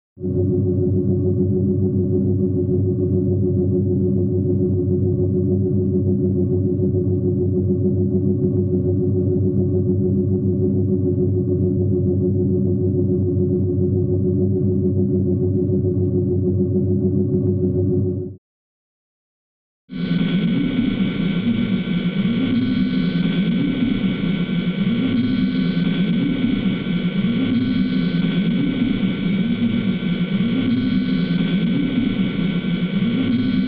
Sci Fi Headquarters Hum, Sci Fi Space Sub Interior Rum; Rumble; Deception Headquarters Hum